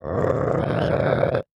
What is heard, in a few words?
Animal Mammal Carnivore Cat Domestic Cat Growl; animals; AdobeCC